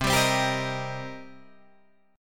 C Minor 7th C Guitar Open D

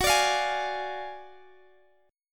D Diminished F sharp

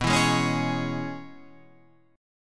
Db Minor B; strummed; Dbm/B; JGuitar

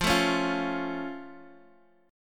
C sharp Augmented, F

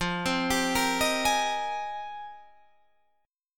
F Minor 11th, Guitar DADGAD

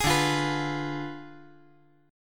D Diminished 7th D down

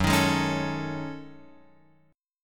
Gb Minor 6th Add 9th Gb Guitar Open F; strummed; JGuitar

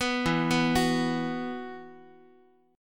E Suspended 2nd, Guitar; Esus2 arpeggiated; x,x,2,2,3,5

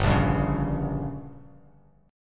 G Diminished, Bass Standard; strummed; JGuitar

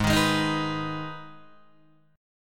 Ab Minor Ab, Guitar DADGAD; strummed; 6,6,6,8,6,9

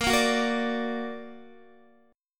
Bb Augmented Bb, Guitar; strummed; JGuitar

